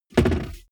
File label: car bmw e46 interior compartment close